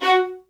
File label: MED ATT F# 3, soft violins; Q Up Arts; Denny Jaeger Master Violin Library